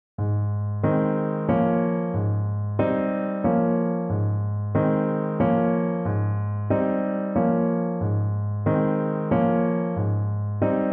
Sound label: piano